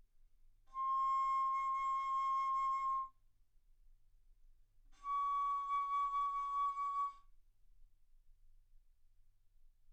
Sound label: Bass Flute ff C6 Db6